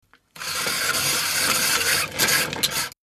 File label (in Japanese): ice